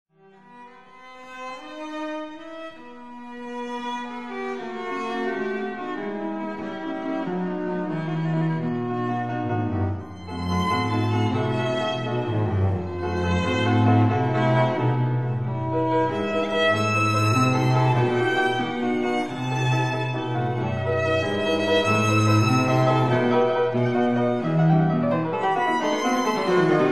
Piano Trio in E major, Allegro deciso; Louise Ferrenc; opus 45

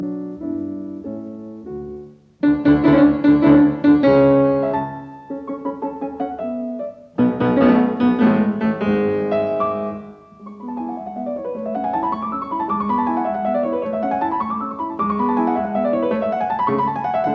Piano Sonata in D major; III Rondeau; Allegro; Piano Sonata No 9; K 311; Lili Kraus

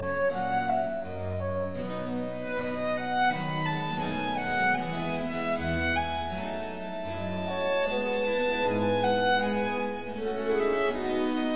Quartet Adagio; Quatrieme livre de 6 quatuors; No 2 in F major; TWV 43F1

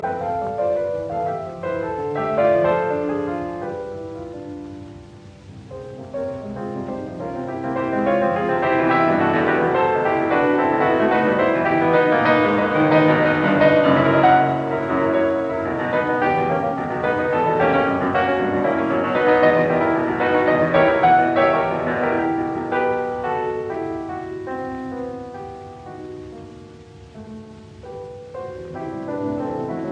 Piano Pieces Intermezzo in E minor; 4 Piano Pieces Op 119 No 2; Wilhelm Backhaus

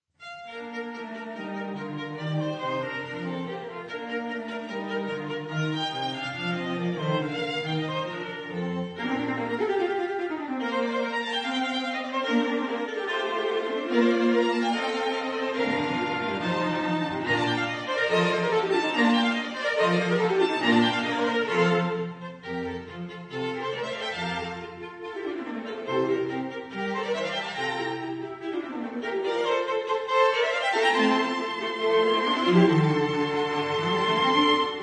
Viola Quintet in B flat, Allegro

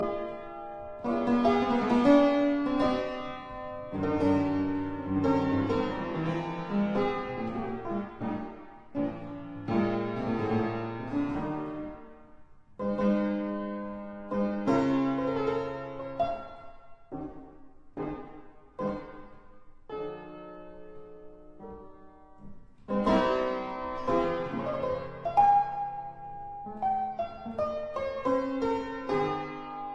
Keyboard Grande Sonata in F minor, Andantino; Op 12

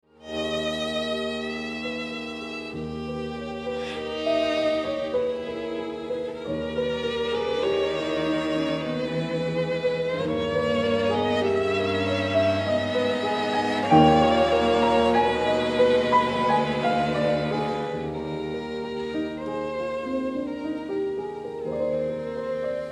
Piano Quartet in E-flat Largetto; Mozart

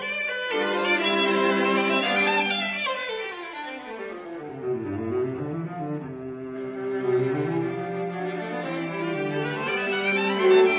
String Quartet in C major, Menuetto Grazioso; Beethoven; Op 59 No 3; Rasumovsky

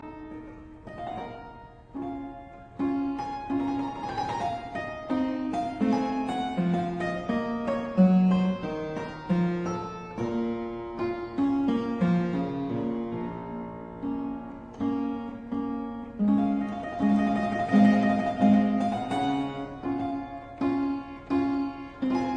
Keyboard Sonata in C minor; Soler; Rubio 100

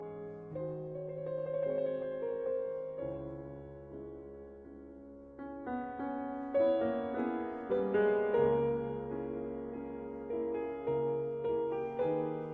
Vocalise arr for piano; Rachmaninoff; Borodin; Vocalise Op 34 No 14

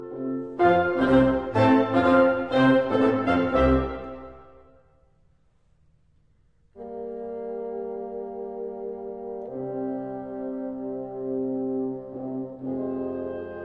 Overture arr for wind ensemble; Beethoven; Fidelio; Op 72

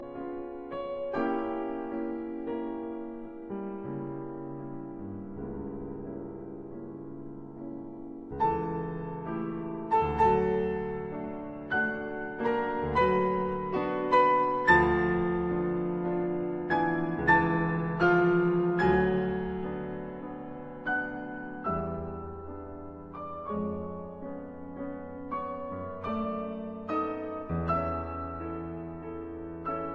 Piano Sonata in F sharp minor, Aria; Piano Sonata No 1; Op 11

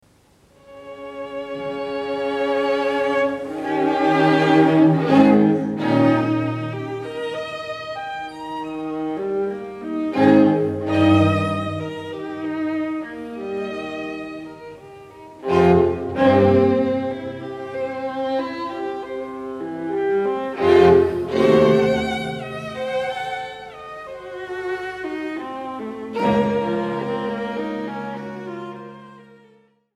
String Quartet in A Minor Op.41 No.1 III. Adagio; Schumann

